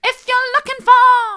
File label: soft, vocal